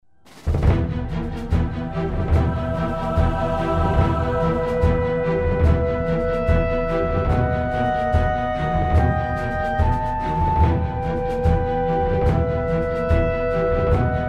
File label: Music background pads